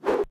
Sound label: jump; wizard; politburo